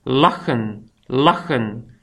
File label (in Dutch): lachen; Néerlandais